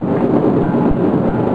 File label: Diesel; U-Boat 1.5.3